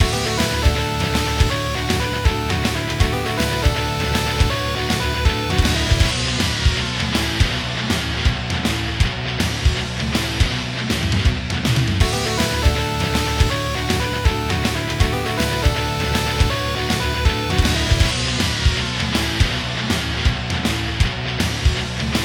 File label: 3d Music